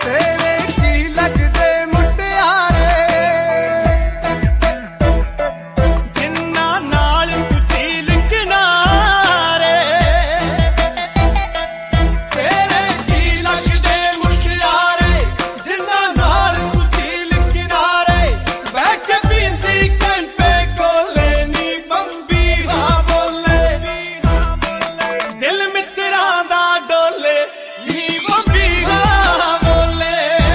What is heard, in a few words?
Punjabi Ringtone